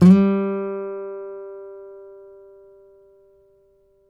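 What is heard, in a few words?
nylon, FLAMENCO, Guitars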